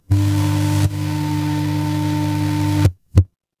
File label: fire electric hum